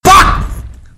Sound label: pain; vanoss